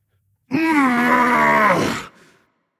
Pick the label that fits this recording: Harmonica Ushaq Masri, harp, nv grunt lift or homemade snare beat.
nv grunt lift